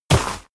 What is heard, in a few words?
step walk gravel